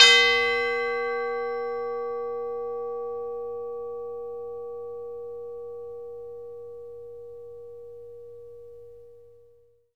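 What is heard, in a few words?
CHIME A4; Synclavier Percussion; World & Orchestral; ILIO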